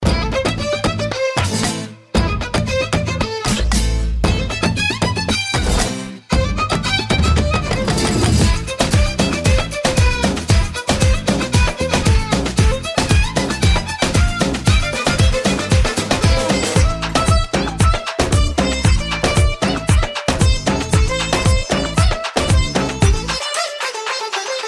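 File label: Violin background music Telugu Ringtone; Jalsa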